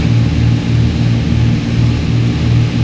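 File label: morava cockpit right, engines; War Thunder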